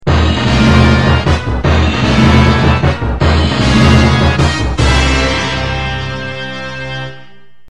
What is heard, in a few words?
music; N64 Project